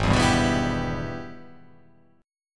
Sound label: Gsharp Minor Major 7th Sharp 5th